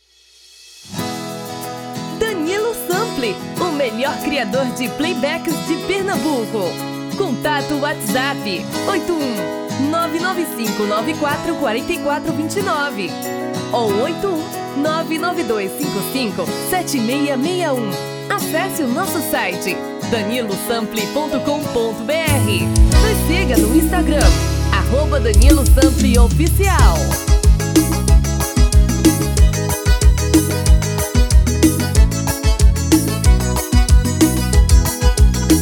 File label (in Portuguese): Forro; Forro das Antigas